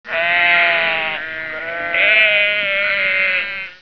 Sheep; T.J. Finds a Friend

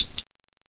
load Click; Bible Fun Pack; Education Publishing Concepts; BIBLELND

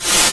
ice blast; Odyssey

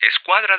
square; Speech; Spanish; Codriver

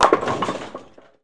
BOWL PIN; REDNECK; RAMPAGER

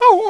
beg, dogz

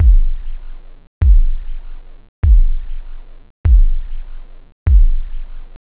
classic, drums techno; OctaMED SoundStudio V1

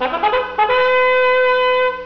Fanfare; Super Maze Wars Demo; Arcade

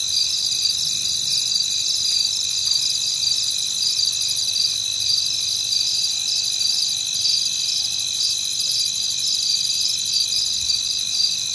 night; CRC2005; CRC 2005 Demo

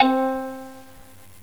Shamisen; GMTones; Ethnic

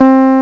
Fretless

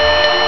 DING

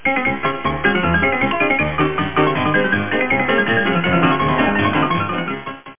hornpipe

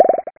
blip blip

